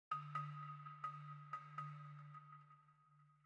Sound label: Balafon